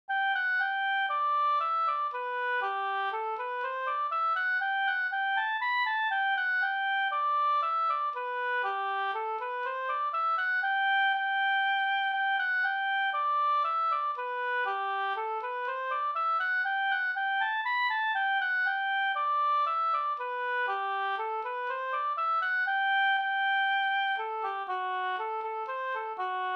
hambopolkett; Tjolavippen e PF Lundqvist Ska

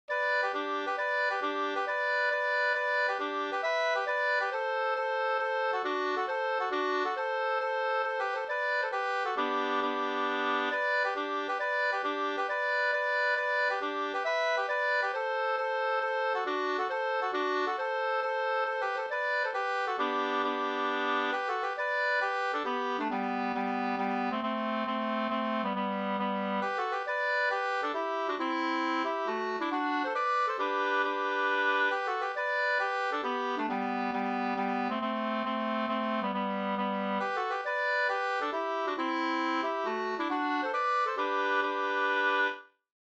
mazurka; fr Hamra e Sigge Mattsson Orsa 2st

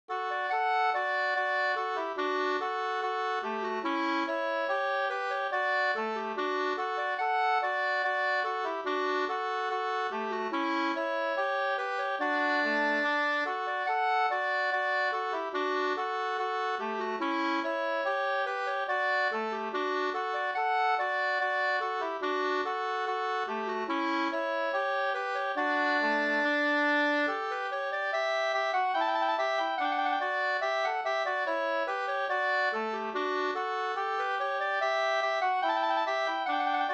skanklat

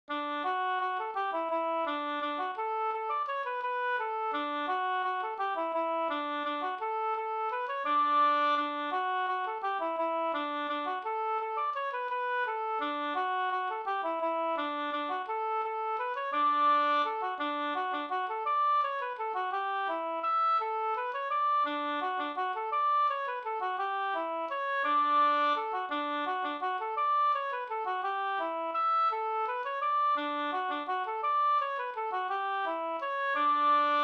vals; Flickornas dans pa kyrkogarden Sm; upptecknad av Teofil Melin

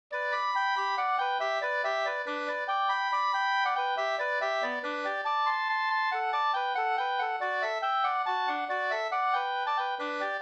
mazurka; storing e Karl Nilsson Karlskoga Vr BgdINp50 2st

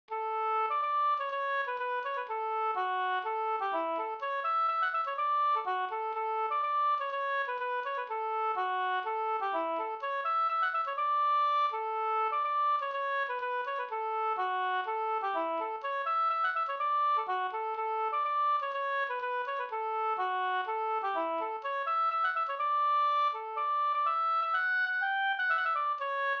hamburska; Sodertalje

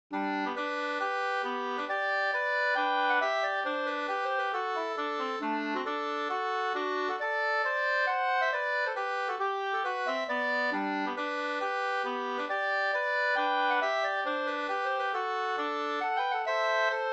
folkdans Ekebypolska; Ekebypolska, hambopolska till folkdans; med andrastämma

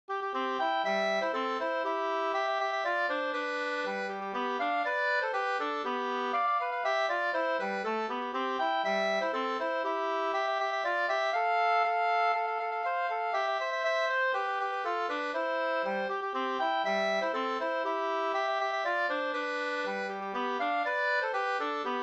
polka, 2st

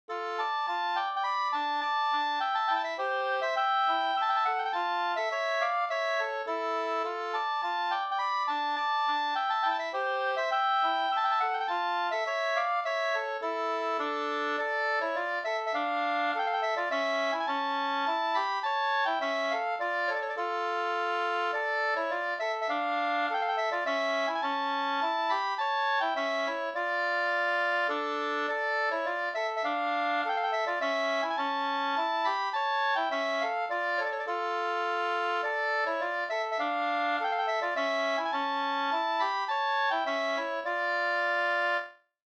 polska Bodapolska

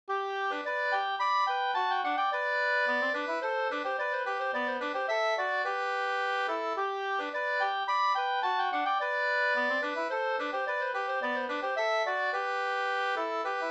slangpolska

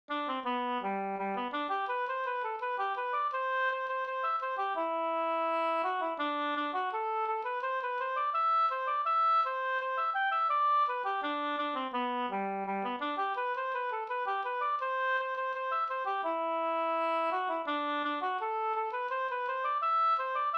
snoa; till Doris av E Fyhr Estenberg Orsa

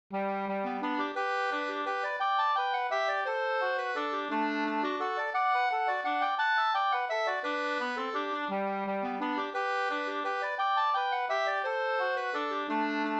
vals

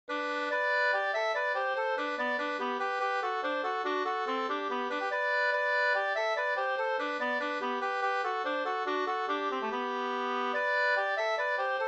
hambopolkett; Stackars den pojk Sd e Ingemar Skoglund 2st

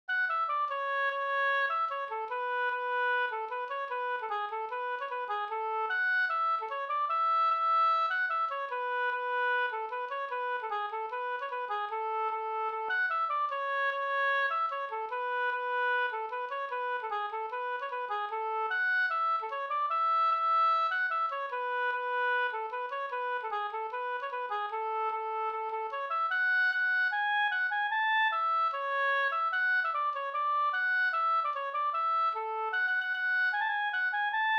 schottis; Edholms schottis av Anders Eriksson An

